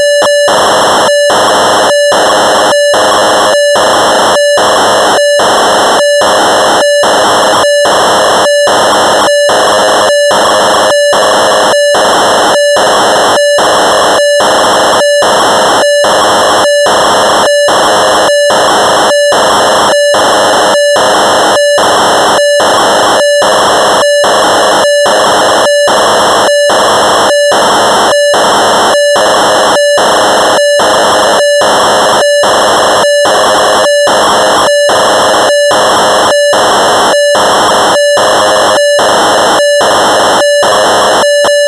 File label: Rhodes Chroma factory presets, Cassette Interface; Synthesizer Factory Patches